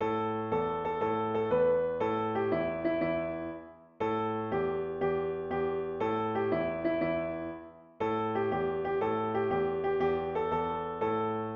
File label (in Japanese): ピアノ